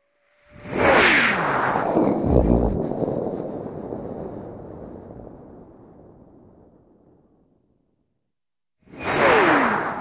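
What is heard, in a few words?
drive-by with voice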